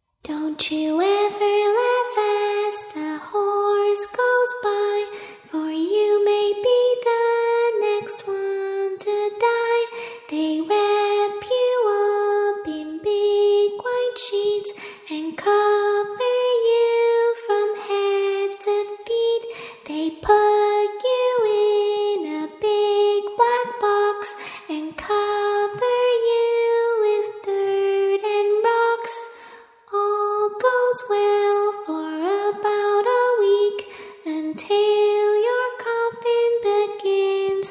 creepy little girl singing the hearse song

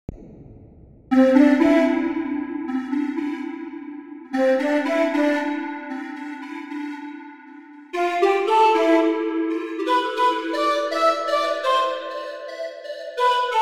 Flute Music

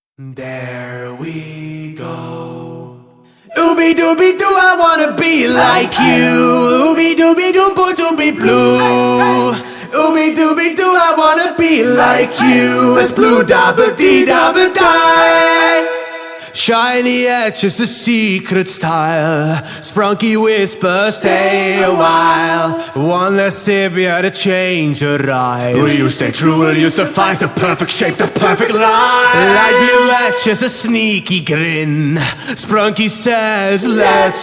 acapella

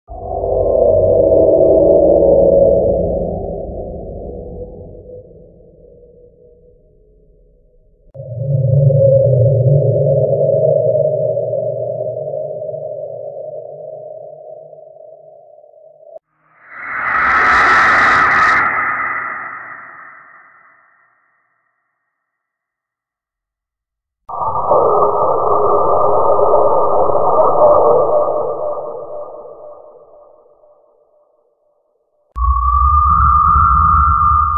suoni spaventosi e inquietanti scary sound; bhoot sound effetti sonori hd original sound

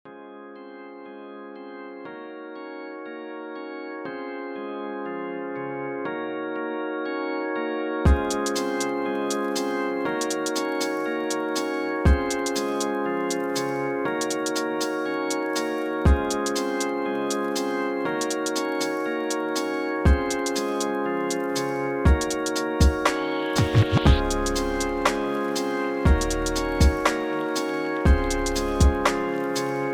lofi hip hop

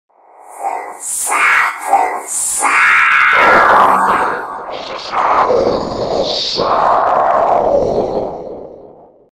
sounds of demonic possession sounds scary demon

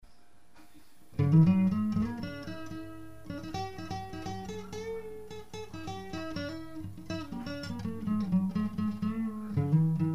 unplugged solo

